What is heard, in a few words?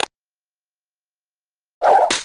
long twirl; vortex